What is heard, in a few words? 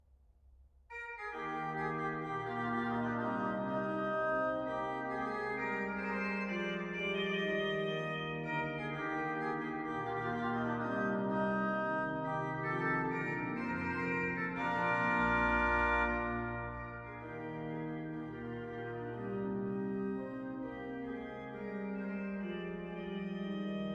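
Branle de Basque, organ; 1640; Prytanee; Louis Couperin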